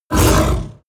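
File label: coloss snarl deep short, Creatures; kreaturen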